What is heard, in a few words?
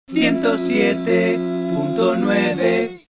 cantante, spanish singer; cantante dial ciento siete punto nueve